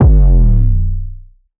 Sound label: 808 B; Cymatics